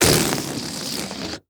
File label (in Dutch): ballon vliegt weg